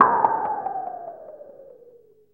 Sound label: NOISE BLOCK, synth perc; Ambient; Zero-G; Ambient 1